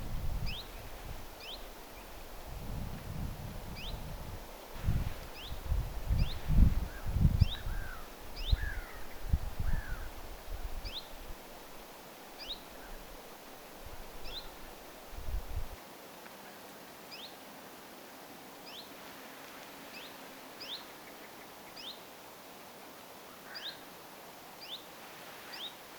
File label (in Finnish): zuit-aaninen tiltaltti; zuit-tiltaltti